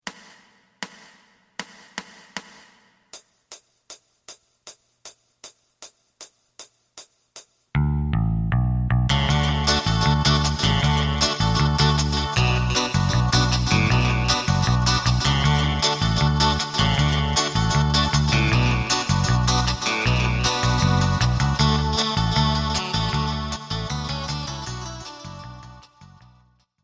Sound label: drum